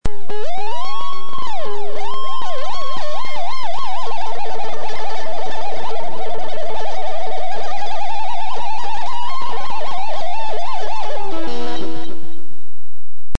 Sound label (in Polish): nietypowa wibracja